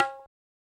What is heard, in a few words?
Percs